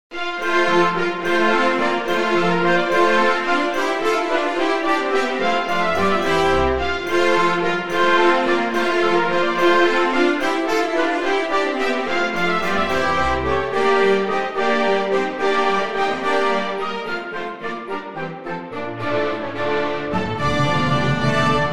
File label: Passepied Orch; drake a pageant play